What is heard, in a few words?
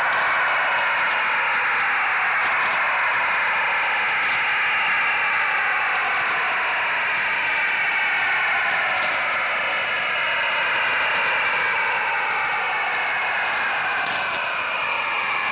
5620usb bpsk 2000 bps